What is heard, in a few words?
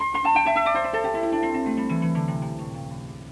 harp